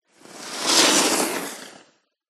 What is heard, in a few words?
Ski By